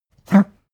pug gag short; natur-tiere; hunde

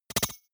noise metal scratch splutter; maschinen; signale; soundpool

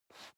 step dress boots metal dry slide short; schritte; Footsteps; menschen

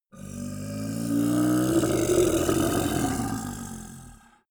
monster dark growl bubbling; kreaturen

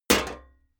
sheet clank damped; hits impacts

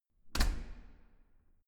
corridor door close normal

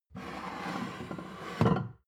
plate stack slide table, gastronomie; berufe; soundpool

